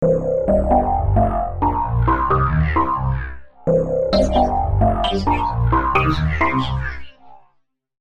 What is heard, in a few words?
WET BASS; eShop